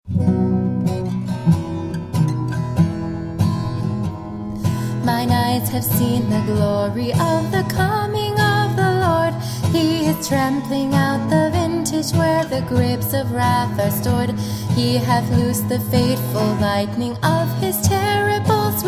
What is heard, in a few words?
vocal; Battle Hymn of the Republic; United States